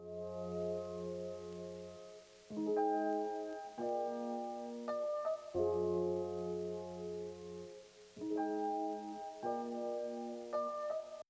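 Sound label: keyboard; USB Soundscan vol.47; AKAI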